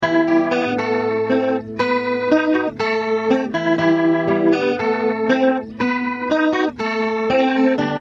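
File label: acoustic guitar rotosphere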